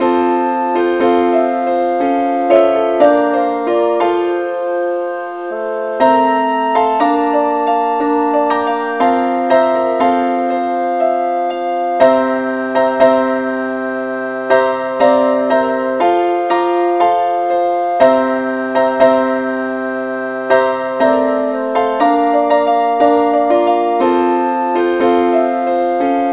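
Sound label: keyboard key B flat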